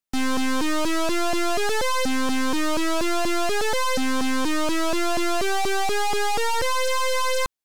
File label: Good Luck Beeper; Split Personalities